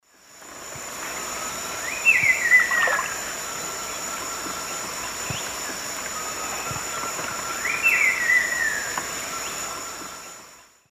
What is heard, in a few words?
Saltator grossus, song